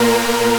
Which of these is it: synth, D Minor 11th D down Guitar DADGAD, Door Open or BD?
synth